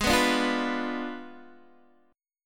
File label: Ab Diminished 7th Ab, Guitar, Open D